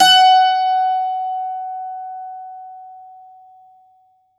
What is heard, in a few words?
bouzouki